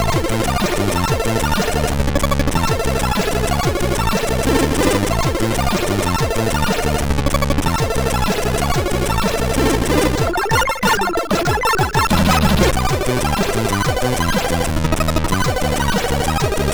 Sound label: sid MOS6581R3